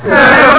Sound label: pain